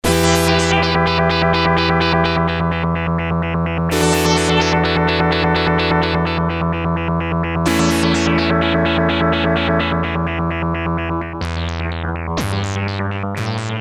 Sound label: Sequential Circuits Six-Trak; 58 Bezmod; Factory Programs